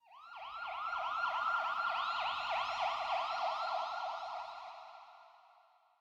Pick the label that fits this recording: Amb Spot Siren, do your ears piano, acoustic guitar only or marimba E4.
Amb Spot Siren